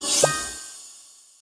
Partymode Crowd

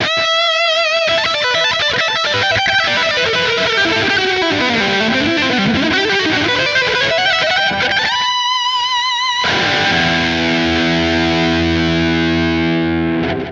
guitar play music; The Sims 2

